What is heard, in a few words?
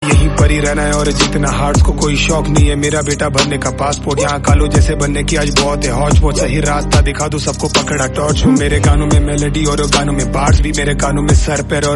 Rap Song